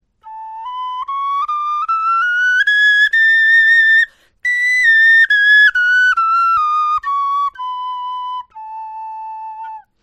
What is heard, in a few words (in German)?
tonleiter, knochenfloeten